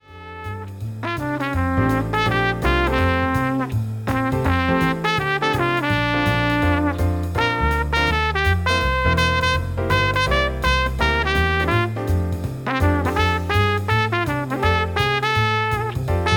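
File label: Jingle Bells trumpet solo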